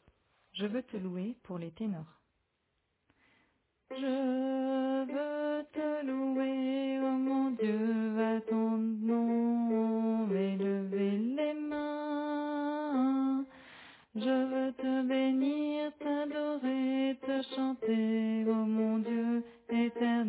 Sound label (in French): Tenor